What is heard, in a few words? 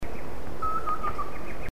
dryocopus galeatus contact